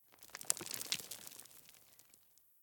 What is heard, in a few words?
Rocks and Dust Movement; The Town; 3D Environment